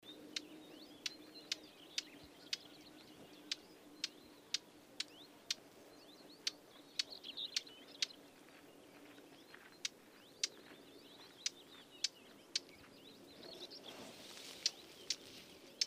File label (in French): fauvette a tete noire